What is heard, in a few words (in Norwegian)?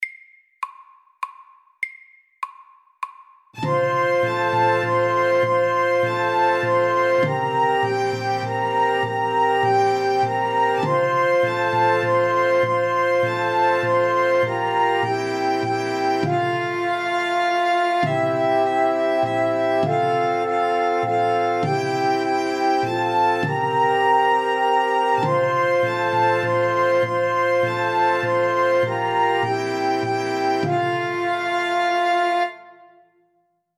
Irsk melodi SAKTE